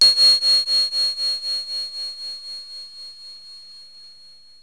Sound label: hi fork, music; CD Mania - The Perfect Multimedia Fun Pak!